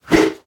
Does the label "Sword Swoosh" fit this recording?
Yes